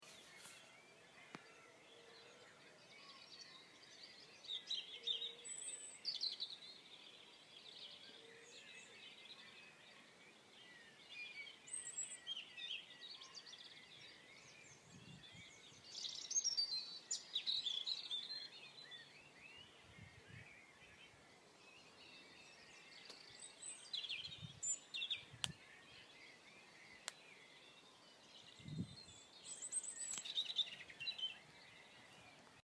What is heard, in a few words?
Dawn chorus